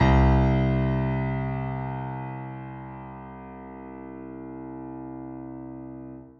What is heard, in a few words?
Piano WT C1; Serum Piano WT; ESW Floom V.1